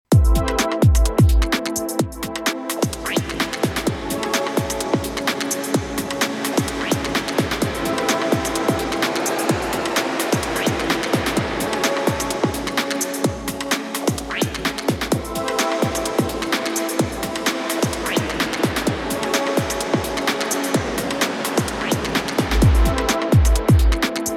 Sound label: Channel Send Reverb